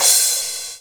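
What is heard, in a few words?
cymbal crash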